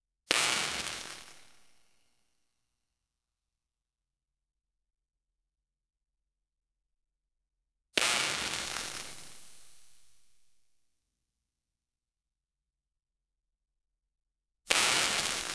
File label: electric sparks in fuse b